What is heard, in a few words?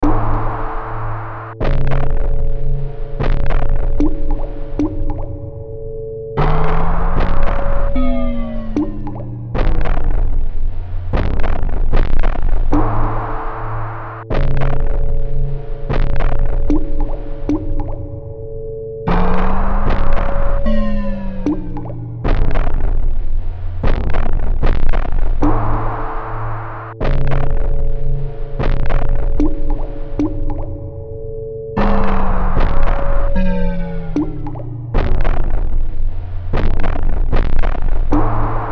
Bed Slow Rhythmic Distortion Drips; Bed Sound Effects; Blastwave; Production Element Imaging Element Accent Transition